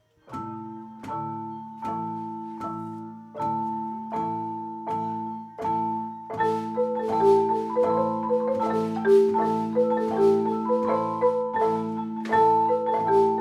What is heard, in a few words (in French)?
percussions; festival